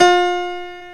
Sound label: PIA SYN; Best Service Gigapack 1